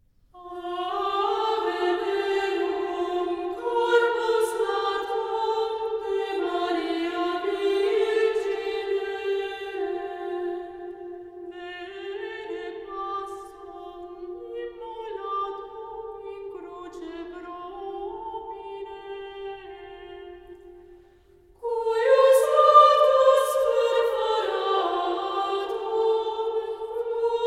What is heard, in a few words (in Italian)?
Canto gregoriano - Ave verum corpus; AVE VERUM-énekek